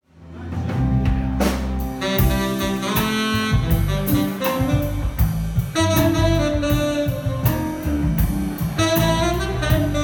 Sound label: Jazz; Jazz Systems; Chaplin Hall